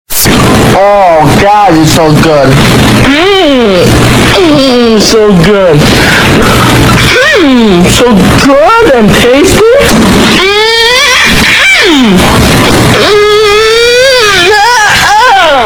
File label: bassboosted; good and tasty